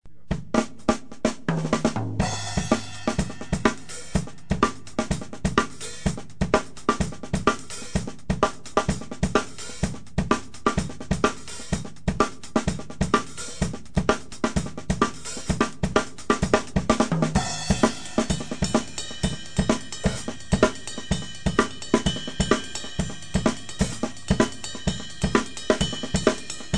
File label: samba funk